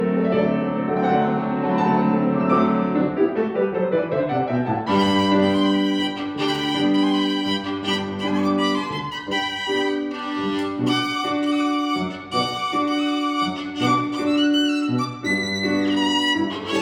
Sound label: Bruch Violin Concerto 3rd mvmt